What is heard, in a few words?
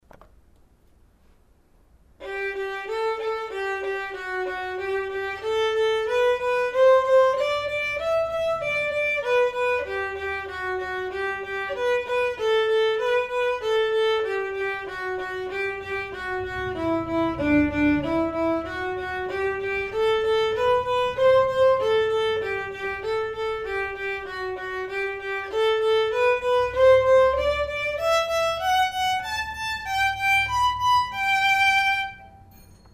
perf vln